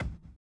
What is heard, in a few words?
collision; Spectrasonics; Metamorphosis